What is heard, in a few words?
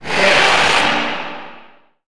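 door open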